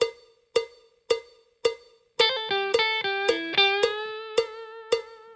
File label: blues tricks full, guitar